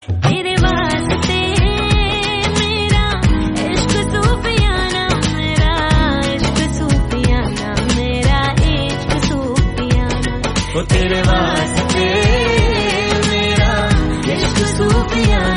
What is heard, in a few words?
female ringtone; Classical